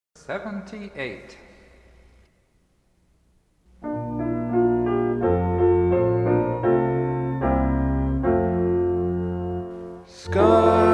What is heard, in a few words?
Hymn